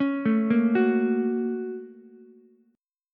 Bb Minor Major 7th Sharp 5th Bb down Ukulele; BbmM7#5; arpeggiated; JGuitar

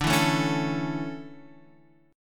D Major 7th Suspended 2nd Guitar Standard, chord; strummed

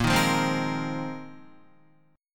A sharp 9th Suspended 4th A sharp Guitar; strummed; JGuitar

